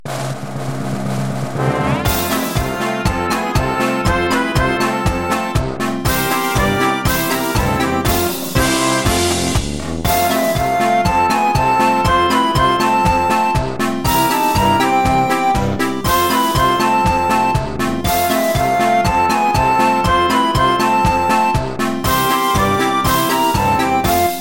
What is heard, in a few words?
Circus accomp